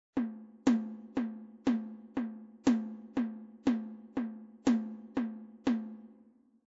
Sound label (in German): Takt Auftakt